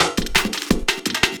LOOP, 170 BPM, Drum & Bass

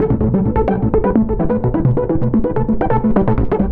Melody, IDM; Sonic Foundry (Sony Creative Software); Twine-IDM

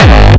Style kick; Bonus Kick

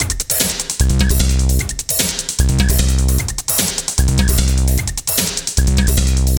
HEAVY, 150; Masterbits - Tekkno Trax; D LOOPS

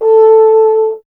BRS F. Horn, Solo Instruments

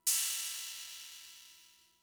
Cymbal, Fricke MFB-522, Drum Machines

